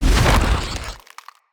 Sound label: Root Spider Mother Foley Jump, Spiders